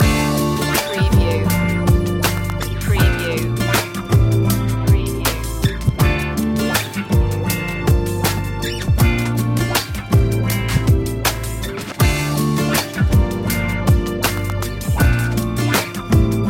soothing soul flash loop

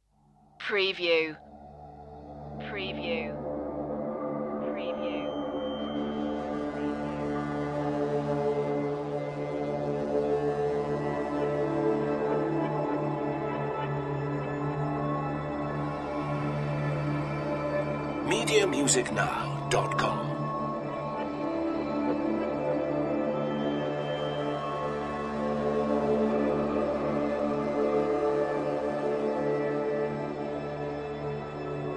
creepy hollow airy

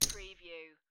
Poker Chips Drop One Chip Onto Another Chip

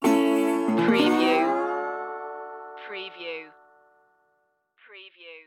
barbed wire blues stinger

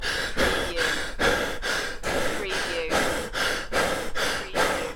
Breathing, Male, Out Of Breath Loop

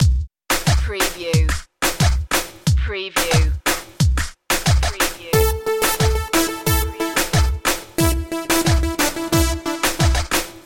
splanglish reaggaeton loop

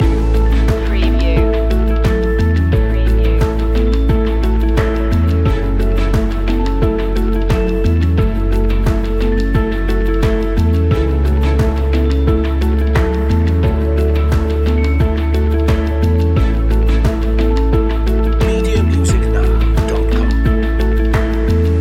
in a warm place loop

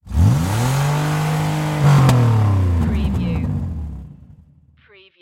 Power Car Single Rev

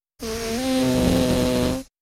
Whoopee Farting Blowing Raspberry Sound; beanstalk audio

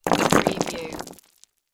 Rock Debris Spill

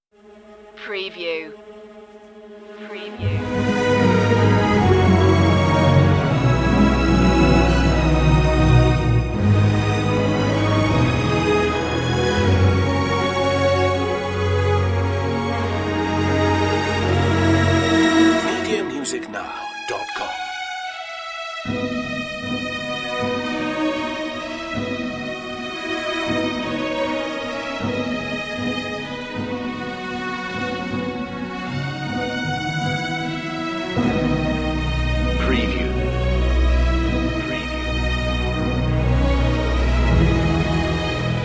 the path home no piano

